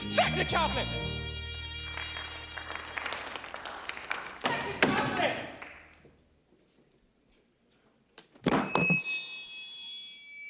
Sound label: mic drop